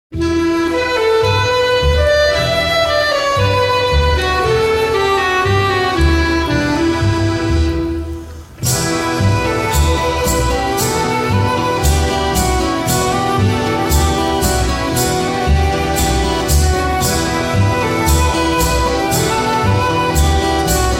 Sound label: instrumental